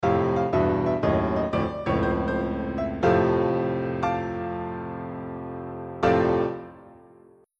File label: jingle; e-phoenix